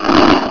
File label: Weird Echo; Centaurian